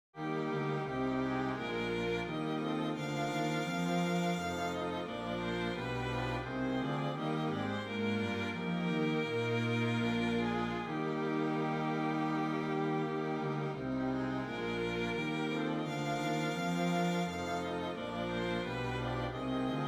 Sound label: Hymn Strings